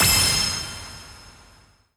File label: Gimmick Hat Correct